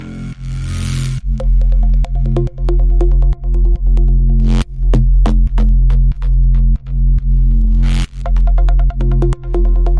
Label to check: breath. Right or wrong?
Wrong